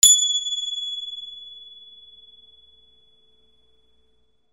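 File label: percussion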